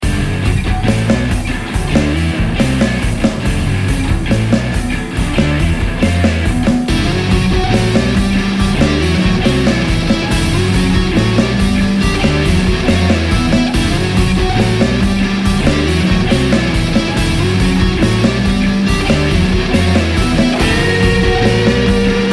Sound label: rock metal angst